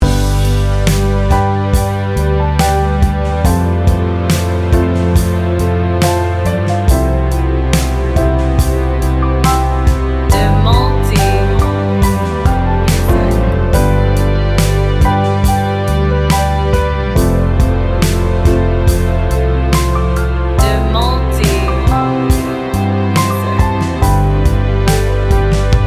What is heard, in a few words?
instrumental ballad; Romansa sejati bukan soal besar atau kecilnya momen, tapi ketulusan yang mengalir tanpa berusaha dibuat-buat.